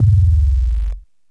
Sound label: warble